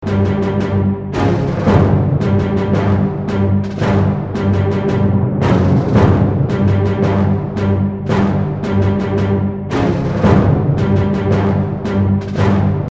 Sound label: main loop; air fight